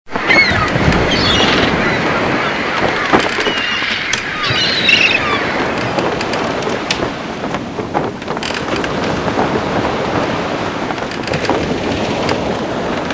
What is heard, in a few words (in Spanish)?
Barco Pirata (Ambiente); Abrapalabra La Magia de Aprender a Leer